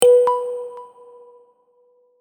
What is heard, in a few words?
lock chime; car locking